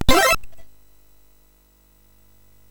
FX, MOS6581R3; HVSC; Software House

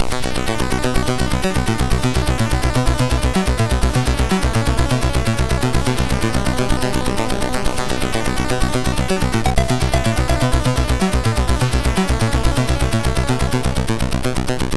MOS 6581R2; Random Bed

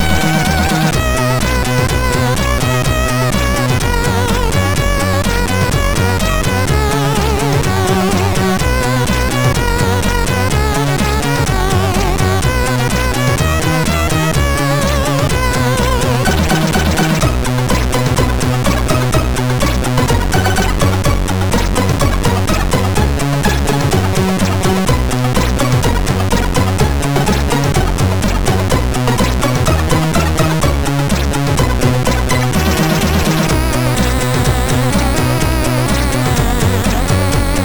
Swedish National Tune; Drutten; MOS6581R3

